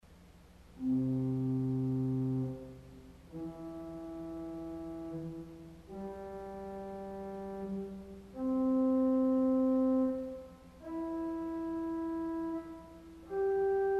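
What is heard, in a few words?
Harmonic Flute 4 Arpeggio, organ stop; CulverAcademy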